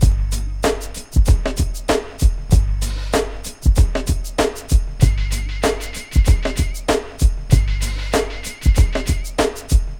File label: LOOP, Drum Loops; Big Fish Audio; Prosonus 500 Drum Loops